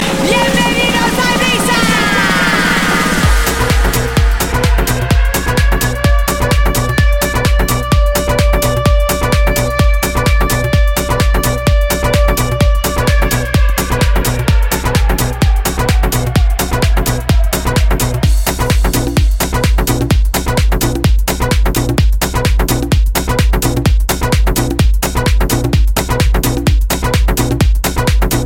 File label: ibiza house